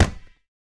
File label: ball hit; fallguys